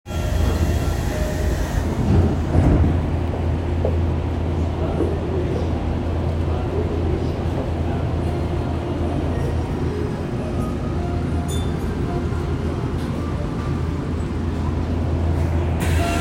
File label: train sounds; 東西線南行徳西